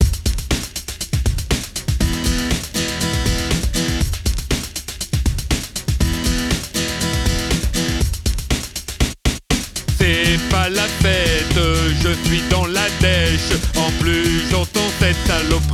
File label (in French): Guitare seche